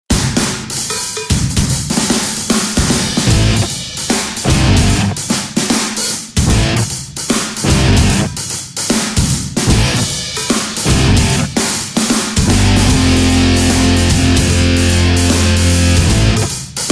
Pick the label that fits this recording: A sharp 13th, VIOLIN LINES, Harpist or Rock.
Rock